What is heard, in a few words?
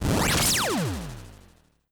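laser cannon single shot; laser cannon 04; tanpatsu muki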